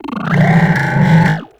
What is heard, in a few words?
Talking Machines; Warframe; Corpus; Railjack